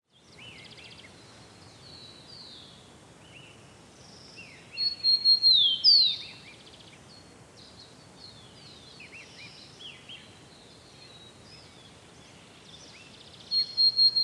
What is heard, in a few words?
FOREST BIRDS